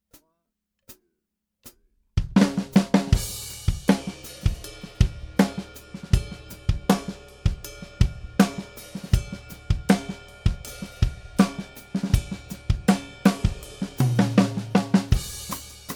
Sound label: drum test